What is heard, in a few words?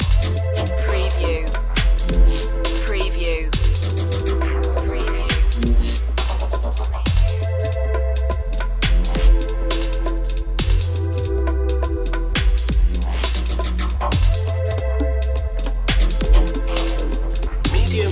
darkness loop